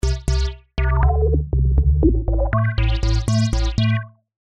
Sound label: Synthesizer parameters Step Sequencer to Cutoff; mapping an LFO, the mod envelope, AND the step sequencer to the filter cutoff